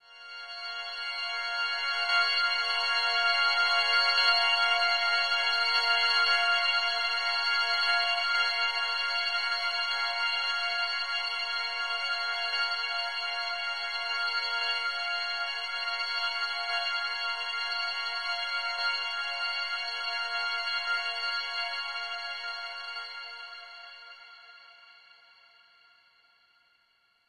Overlay Drone; The Shard

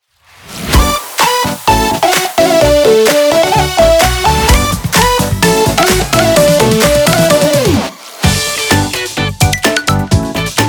Little One (Instrumental); Honkai Impact 3rd; Elf Academy

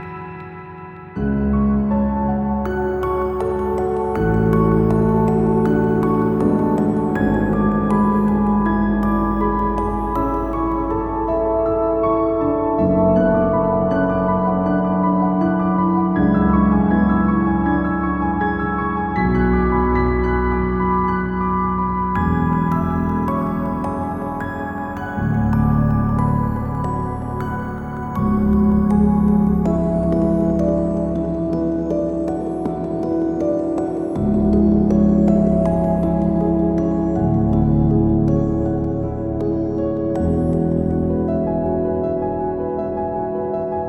Piano

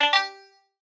Tune Notification

